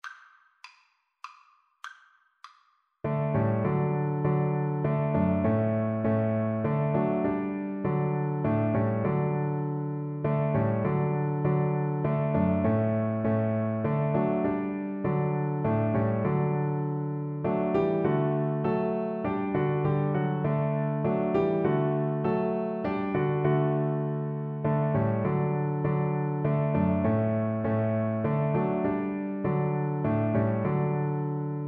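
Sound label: vlc karaoke, Cello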